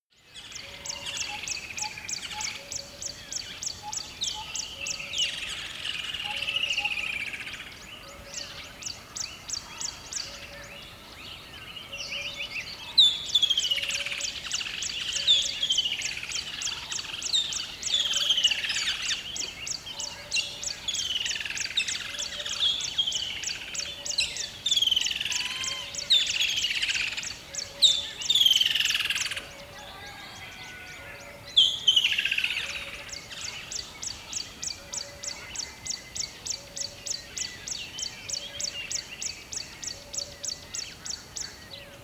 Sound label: river dawn single bird, dschungel regenwald; atmosphaeren umwelt